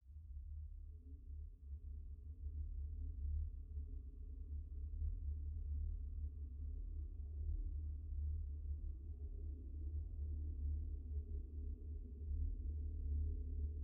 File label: Virgin Rain Forest Scape; XZNN Audio EXTRACTS